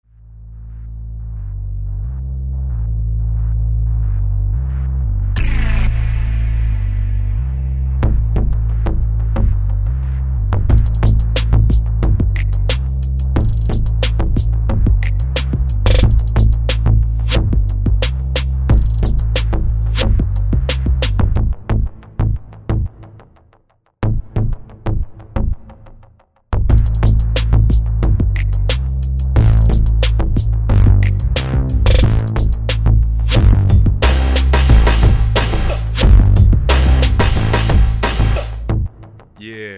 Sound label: MF Beat